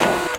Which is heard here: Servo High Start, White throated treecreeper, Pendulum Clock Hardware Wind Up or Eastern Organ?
Servo High Start